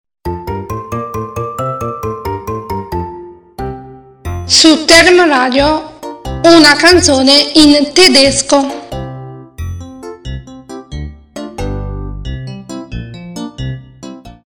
Canzone in tedesco

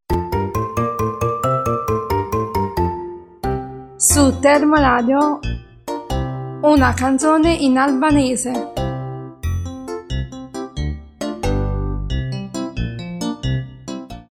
Canzone in albanese

